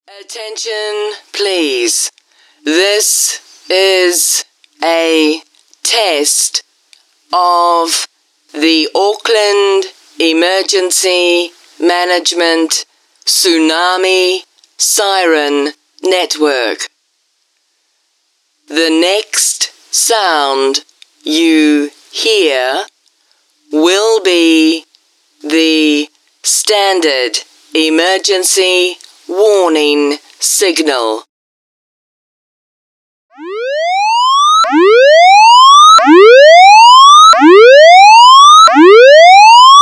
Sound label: siren test